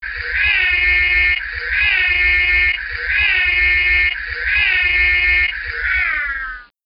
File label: siren